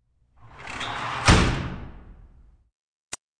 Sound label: Track, Doors; 헐리웃특수효과음; 문 소리